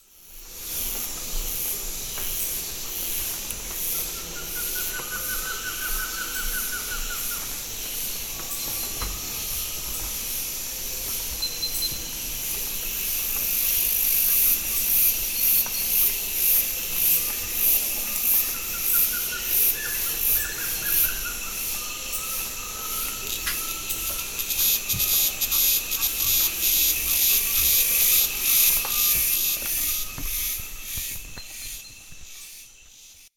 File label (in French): Ambiance dans la jungle